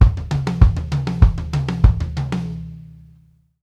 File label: TWO TONE, DRUM FILLS; Sampleheads - New York City Drumworks VOL-1